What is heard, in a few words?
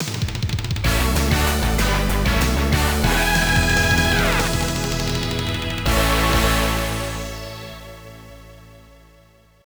Win (Goal BGM); wave shark konami zr107 arcade